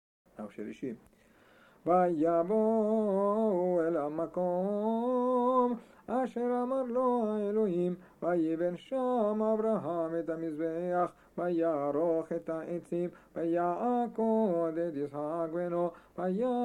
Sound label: Rosh Hashanah Shaharit Parashah for second day shelishi